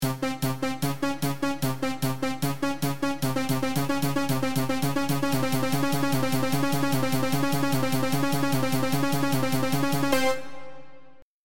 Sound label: drowning midi; sonic advance